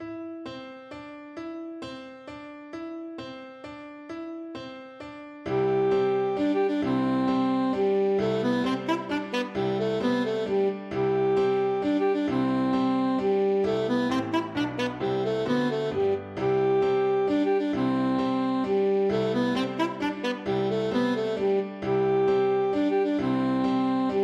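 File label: Bizet Carillon alto saxophone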